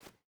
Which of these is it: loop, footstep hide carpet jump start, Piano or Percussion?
footstep hide carpet jump start